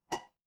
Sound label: glass ceramic